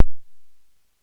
VCA POP, TB-303 Synth

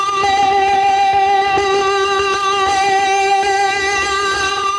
Lap Steel Fuzz 100 G, guitar drone

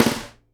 snare GLASS, Live Snares

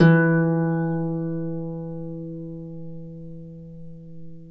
Celtic Harp, Plucked Strings

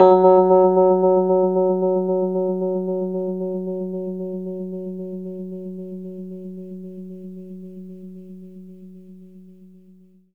Rhodes fast F#; 3D Sound; 3D Keys VOL-1

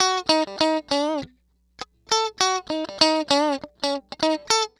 Electric Guitar

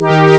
brass accord; Amiga Soundtracker Sample Packs

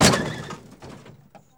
crash, Crash Engine, Transportation; E-MU Producer Series Vol. 3 – Hollywood Sound Effects

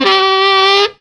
LIFT OFF, FUNKY SAX; Zero-G; Funky Elements

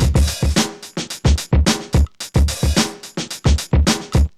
bass end 109; Zero-G; Funky Elements

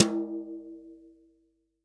TOM, Real Drum Kit, SWING KIT

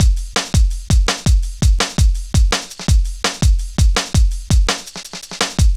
Drum Loops, Jungle, 166.5

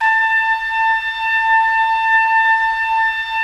Trumpets; Roland - LA Composer vol. 4

